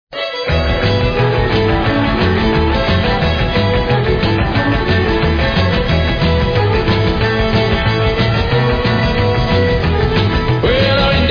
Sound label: Buzz saw Fiddle